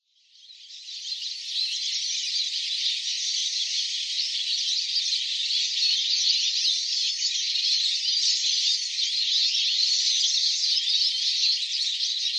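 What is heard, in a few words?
Eurasian siskin, hitachi; mahiwa; koe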